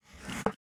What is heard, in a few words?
selection reverse, ChromeVox Earcons, No Reverb